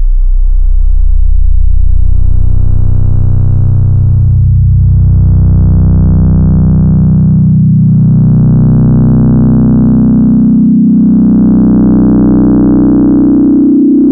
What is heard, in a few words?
filter pad; jazz jackrabbit 2; M8